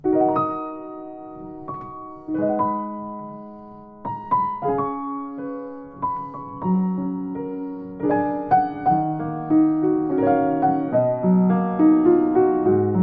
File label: Wulfar UP120 T, piano